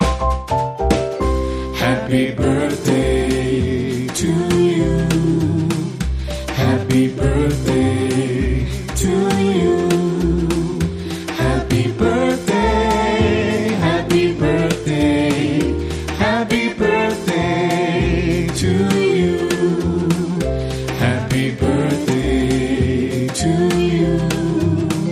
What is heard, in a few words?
Vocal Jazz Happy Birthday